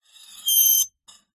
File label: warfare knife tip scrape scratch metal screech piercing